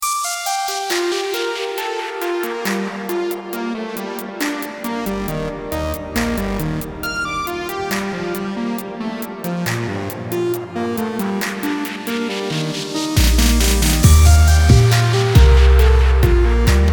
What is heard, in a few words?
vintage synth 70s analog synth lead; logic pro; vintage synths